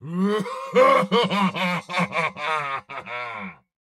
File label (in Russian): Смех; Турбосвин